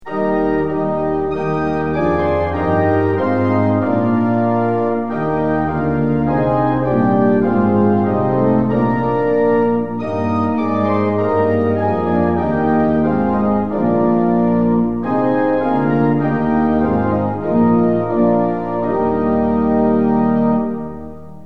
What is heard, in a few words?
tune; hymns; lillenas